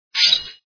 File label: gleam; Chibi Knight